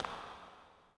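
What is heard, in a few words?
echo run, footsteps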